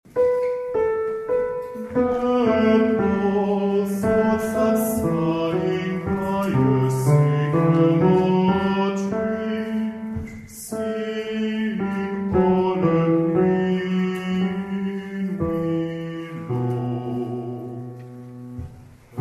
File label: Basses; Willow Song